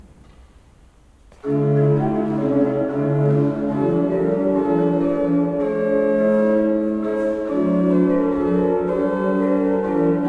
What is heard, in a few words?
pipe organs; Schuke 30 III P